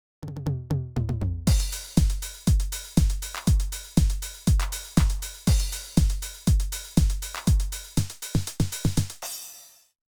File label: 909 example